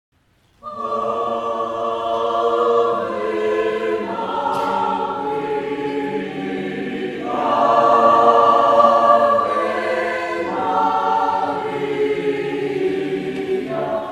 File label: Ave Maria double choeur